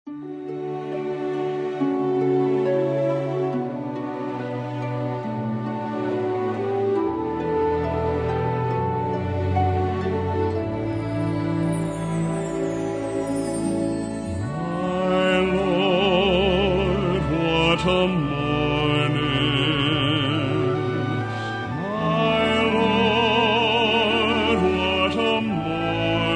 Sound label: Men's Choir